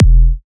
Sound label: Punchy Kick